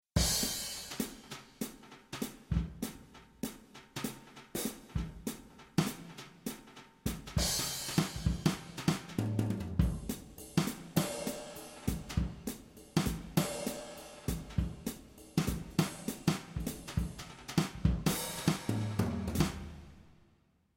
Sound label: Brush Drum Set; sibelius